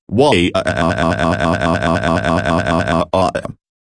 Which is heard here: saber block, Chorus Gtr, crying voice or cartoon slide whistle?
crying voice